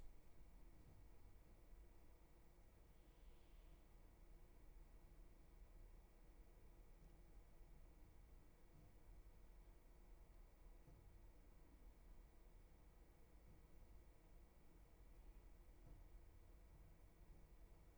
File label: roomtone